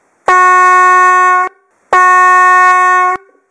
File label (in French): son klaxon duo 12v trompe de 42 cm; klaxon mdk 406 duo 12 v